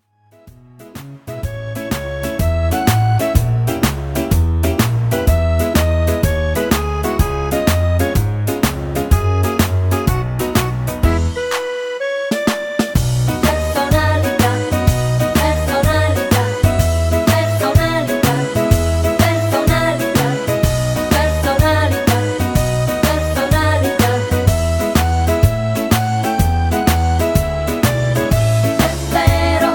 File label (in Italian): Swing Boogie Donna Canto